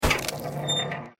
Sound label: Spring step; The Joy of Creation Halloween